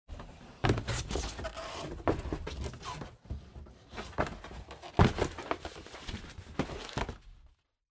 Cardboard Box Fold; ダンボール03-14(畳む); 効果音＞梱包材

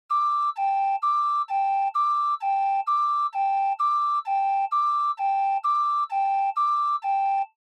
Ambulance Siren Fake High

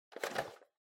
Portable Stereo Pick Up

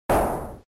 Arcade Shooter Damage

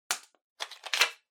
Handheld Transceiver Battery Cover Open

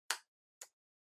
PC Keyboard Far Shift

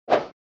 SNES Golf Swing

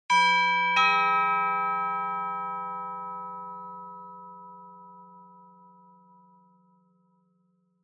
Doorbell Mech Tone, High Close; ドアベル 機械式 トーン03-1(高 近い)

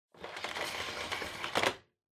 Vacuum Cleaner Cable Wind Up

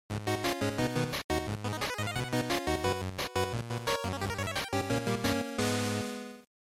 GB Racing Game Over

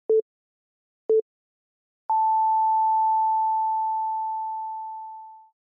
Time Signal Beep Mid

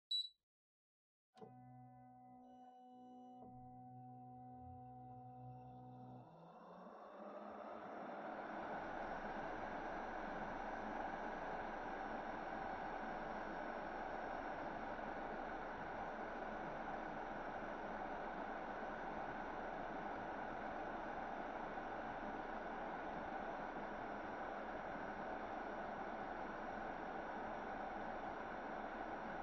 Air Conditioner Far Mid

